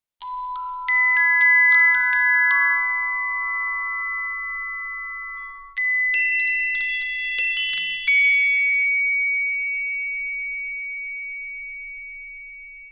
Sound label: Glocs WSOLA